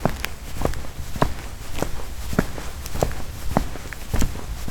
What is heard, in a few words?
Boot Footsteps